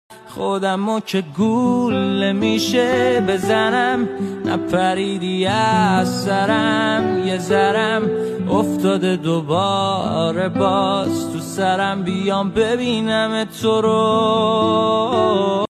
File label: Guitar